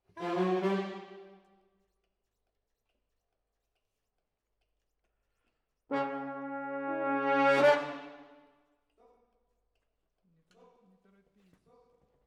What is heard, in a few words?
Horns Over